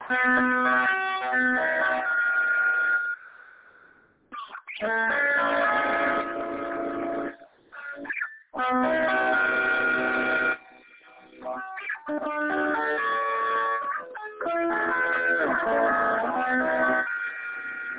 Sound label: slow cloudlike then fast metal